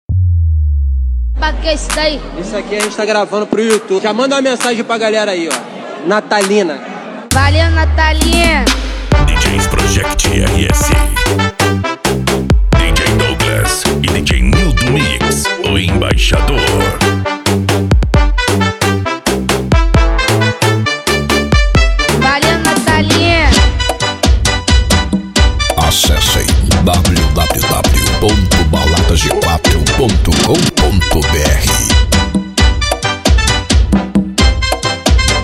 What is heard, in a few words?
mega funk remix